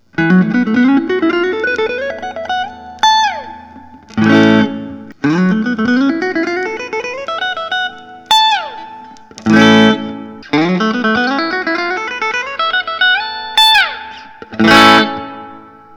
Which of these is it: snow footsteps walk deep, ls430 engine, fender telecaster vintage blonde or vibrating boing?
fender telecaster vintage blonde